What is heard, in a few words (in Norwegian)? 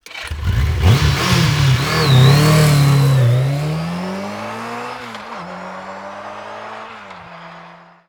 motorsykkel